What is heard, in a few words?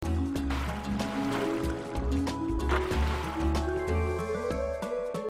Game Tune